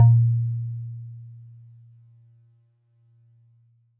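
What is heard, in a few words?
Warble Celeste DX100 A#0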